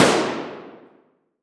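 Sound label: Weapon Gun Shot Gun 12 Gauge Single Shot Interior Shooting Range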